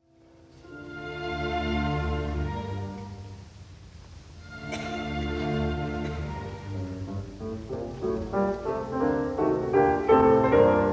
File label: Piano Concerto in A minor, Intermezzo, Andantino grazioso; Schumann; Op. 54